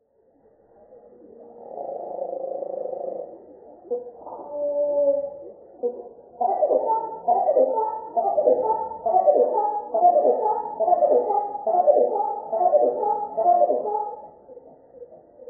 Massachusetts Eastern male Marsh Wren 4 songs 1 slowed 8x; Donald Kroodsma